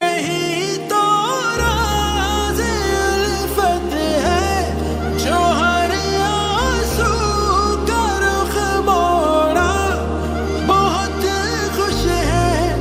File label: Ost ringtone